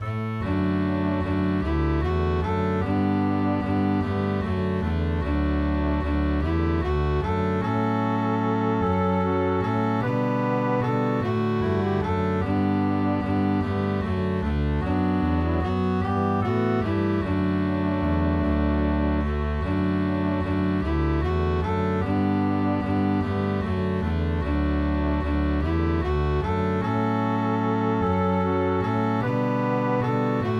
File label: Chord Melody Basic Melody Bass Harmony; Land of Rest